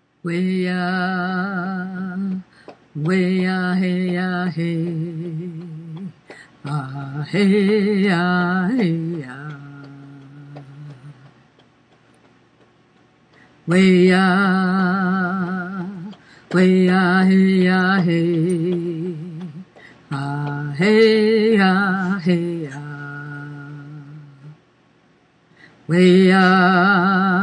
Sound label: chant